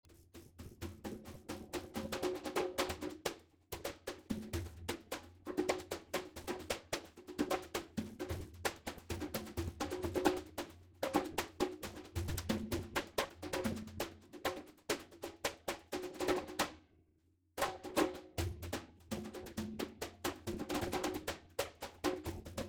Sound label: CONGAS Residual Noise